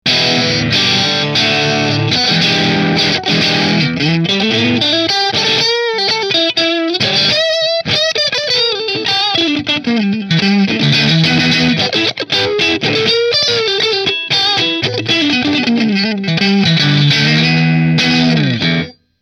Baker Position 3 Marshall, guitar; Position 2; B3 Metal XS Kerry Green

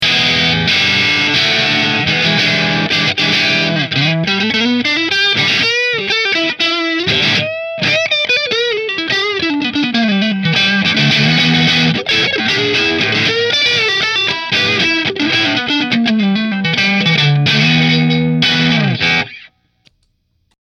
Rahan Ambrosia Position 3 Marshall, guitar; Rahan Guitars RP Single Cutaway Ambrosia

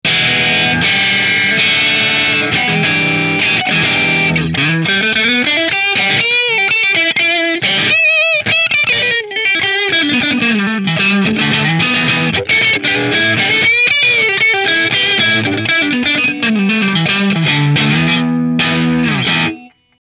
Middle Mini Position 1 Marshall; Melancon

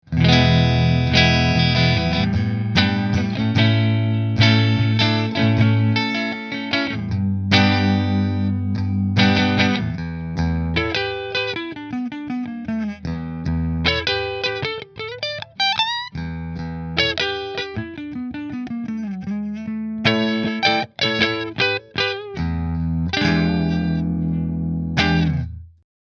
Nola JB14 Neck Split Fender, guitars

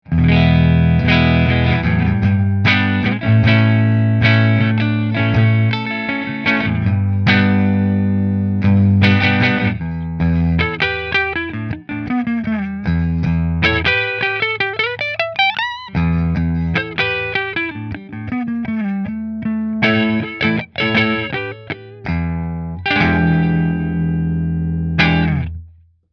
Greco Neck Fender; Greco Les Paul Custom White; guitars

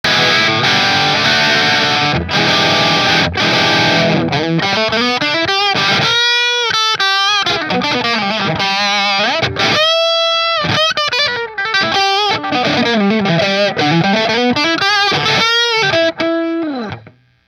Robin Avalon bridge marshall, guitars

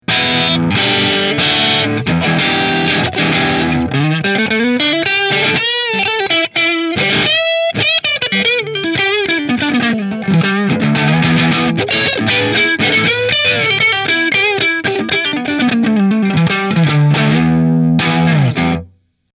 Nola JB14 Custom Middle Marshall

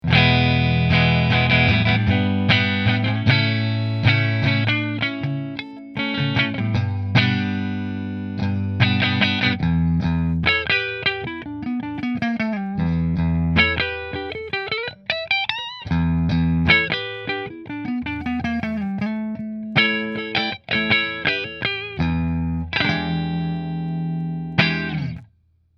Guillfords Neck Fender, guitars

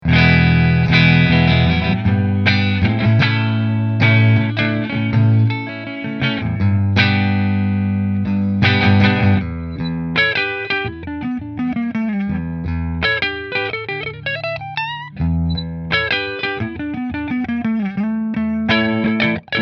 Gibson Goldtop Neck Fender

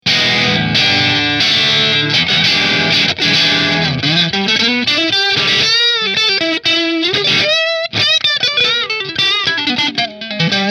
Tokai Strat Position 1 Marshall

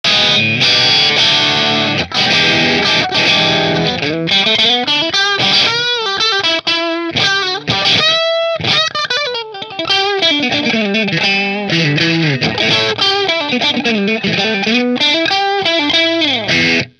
Robin Ranger position 4 marshall, guitars

